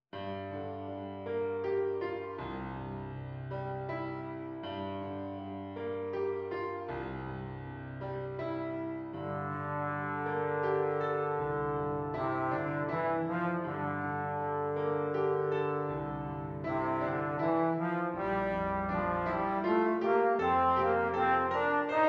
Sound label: trombone; bass clef or bb treble clef